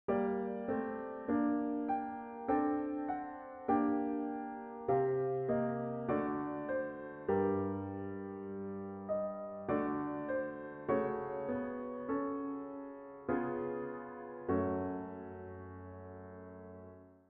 gealtereerd leidtoonverplaatsing enharmoniek

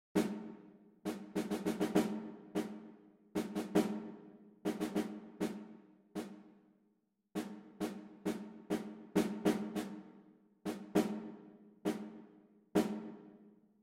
tentamen ritmisch, solfege

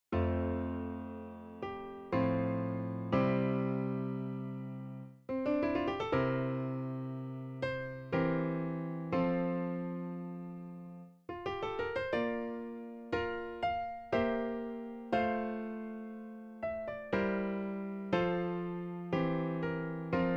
harmonisch

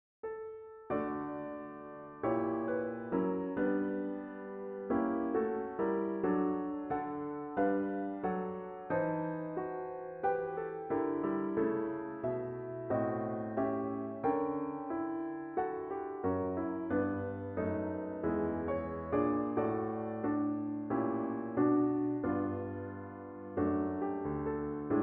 gealtereerd leidtoonverplaatsing enharmoniek

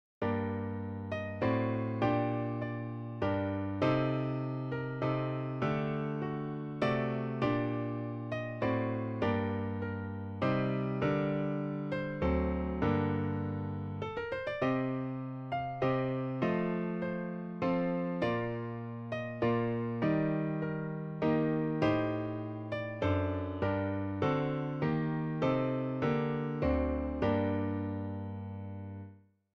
tentamen harmonisch, solfege

